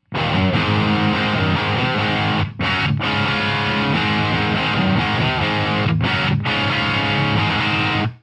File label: Ace Tone 2x12; Rock